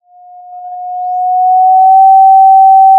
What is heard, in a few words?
Sound of a police car siren